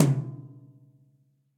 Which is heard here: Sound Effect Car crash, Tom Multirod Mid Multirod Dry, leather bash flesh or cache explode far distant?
Tom Multirod Mid Multirod Dry